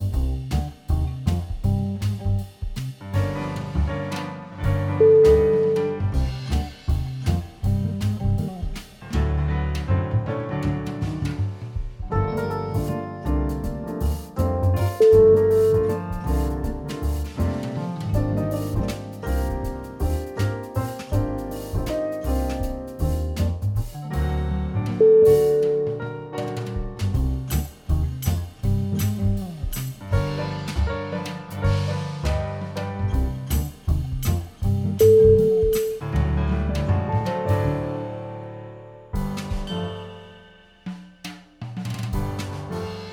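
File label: get jazz, music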